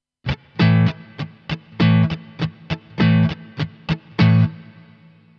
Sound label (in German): Schlagmuster Übung, gitarre